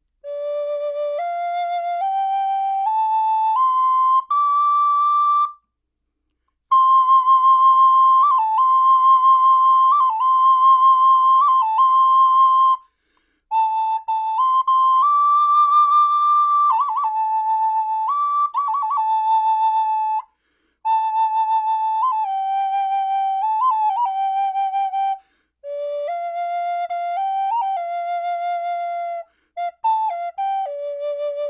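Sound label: natural, flute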